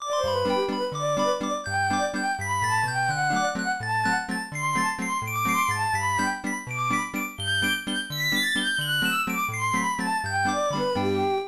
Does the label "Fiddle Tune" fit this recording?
Yes